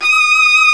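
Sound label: D#6 VIBRATO VIOLIN; Northstar - New Gold VOL-2; ORCH.COMP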